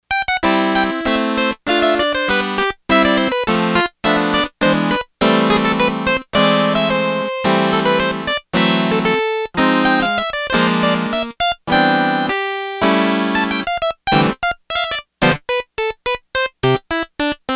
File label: Clavecin; Yamaha TX802; Factory Patches; Voices